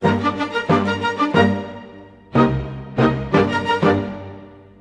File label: g min full mix timpani; PowerFX - Downtown Orchestra; Orchestral arrangements